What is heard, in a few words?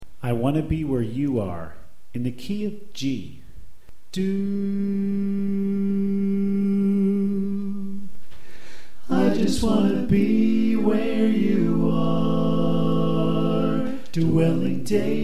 Tenor Left